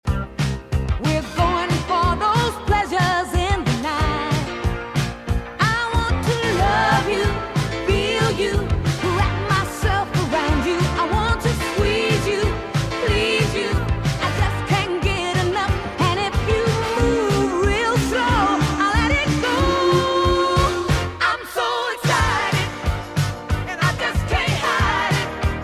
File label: Disco